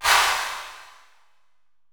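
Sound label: 808 clap; 480L; Wizoo; Platinum 24 Electronic; 480L MP 808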